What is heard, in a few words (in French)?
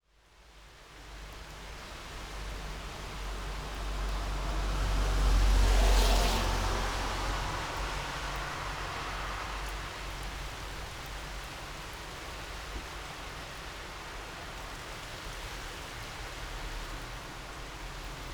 Voitures pluie et orage